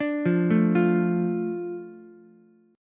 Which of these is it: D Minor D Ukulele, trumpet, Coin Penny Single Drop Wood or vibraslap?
D Minor D Ukulele